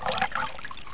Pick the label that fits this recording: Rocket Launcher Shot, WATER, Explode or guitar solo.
WATER